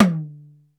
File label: BEAT KITS; Crypton - DrumBase5000